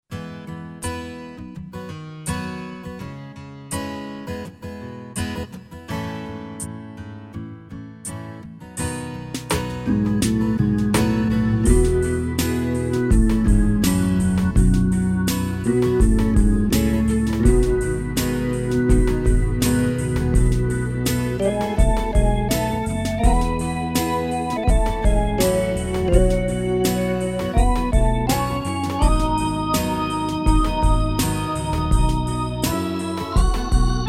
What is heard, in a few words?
Erotic Hammond